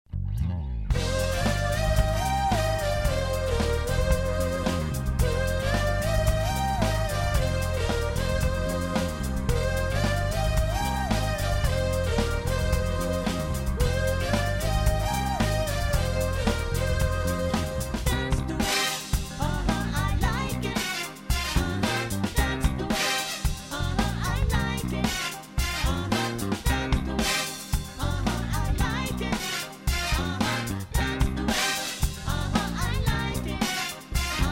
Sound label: Disco